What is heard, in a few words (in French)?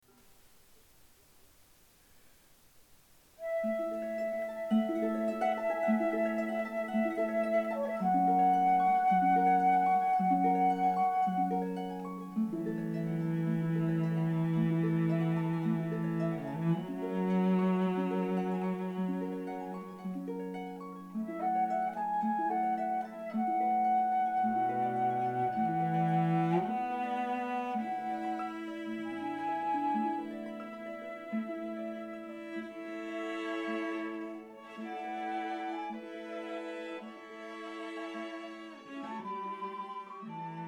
quintette